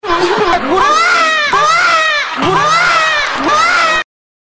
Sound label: Waahhh; Yuri